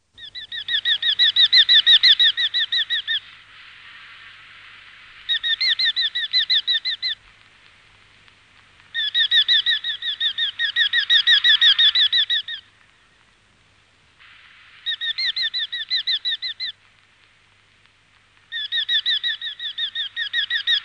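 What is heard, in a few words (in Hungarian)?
Kabasólyom; Madarak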